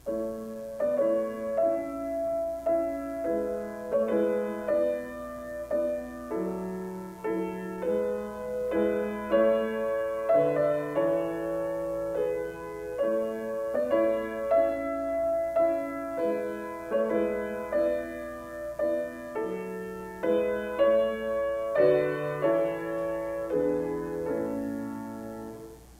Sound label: Chamber Music